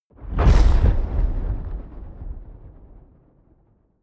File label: fire whoosh